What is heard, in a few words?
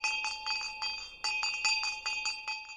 handbell